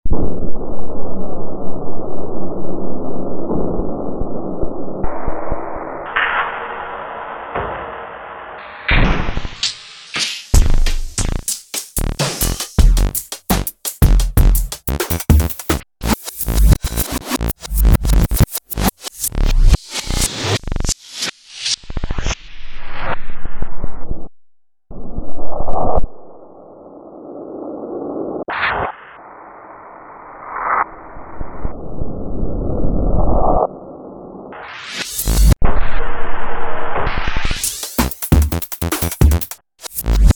e-mu esi-2000 sounds, pitchbend scratch

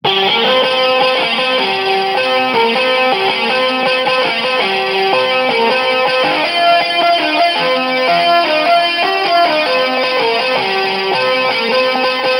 finger guitar; tchibo

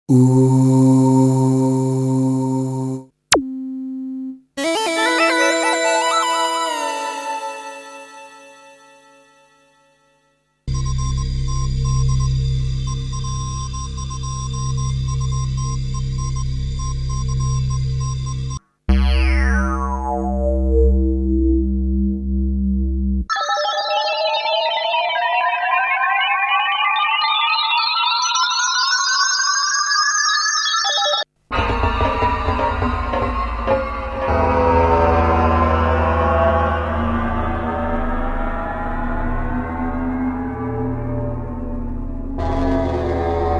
alesis quadrasynth s4 sounds

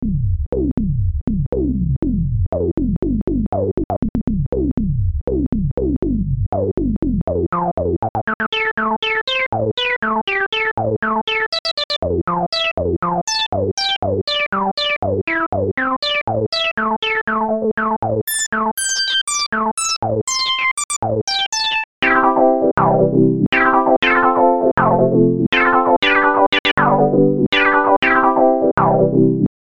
emagic es1 filter lpf24c q100

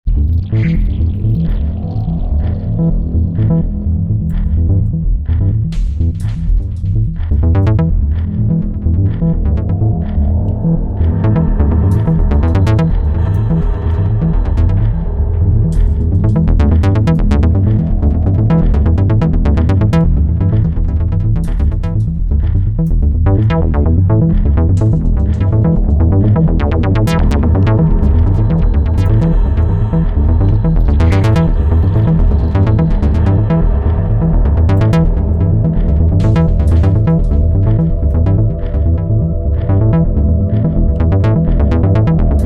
korg wavestate; virgin

